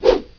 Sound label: whoosh; Of Cabbages And Kings; pygame